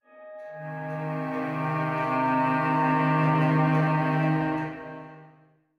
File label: tension note; the 39 steps; Last Steps